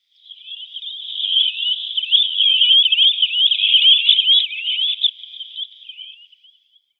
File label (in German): Wiesenstrandlaeufer Calidris minutilla, Vogelstimmen